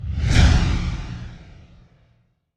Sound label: Splash Transition Enter; aloha